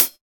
Original Closed High Hat Sound D Key, pedal hi-hat sound tuned to the D note; one shots; hat samples